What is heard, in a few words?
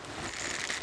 archer powershot loop; HighGroundRoyaleNetcode